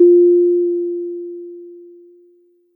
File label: Tongue Drum